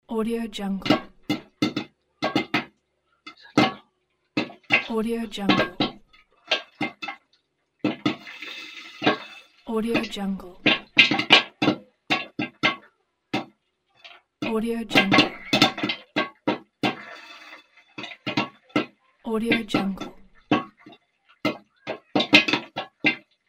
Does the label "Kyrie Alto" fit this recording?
No